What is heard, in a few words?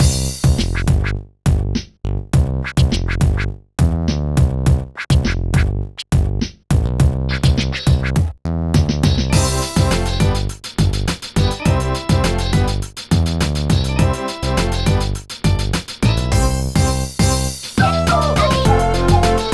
Music BPM105; Super Scribblenauts 2010 NDS; LVL14 PEAK2